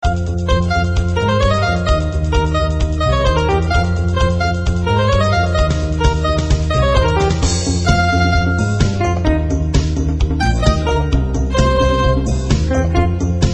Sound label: instrumental